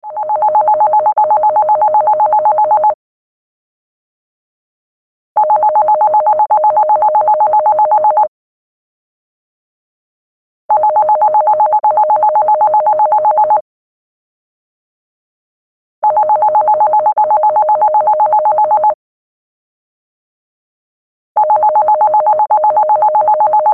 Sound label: landline phone ring ringtone